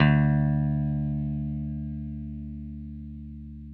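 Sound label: 6 string bass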